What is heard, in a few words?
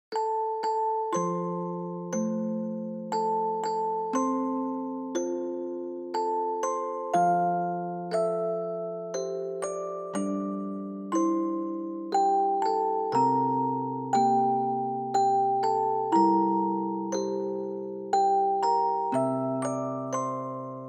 Lullaby Reverb Slow; bgm; Brahms